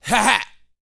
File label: GRUNT, MALE HUMAN; R&B & Dance; ILIO; Vocal Planet